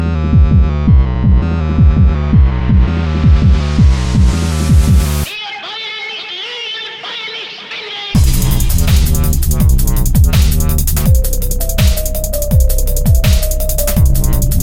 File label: drumstep